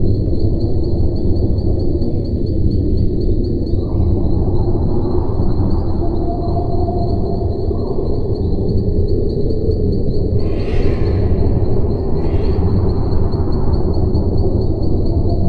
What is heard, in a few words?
Night Ambience; Gauntlet Seven Sorrows